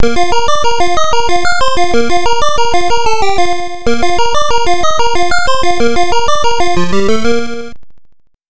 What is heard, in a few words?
arcade congratulations; moon patrol